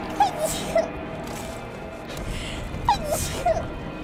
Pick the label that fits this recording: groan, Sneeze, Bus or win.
Sneeze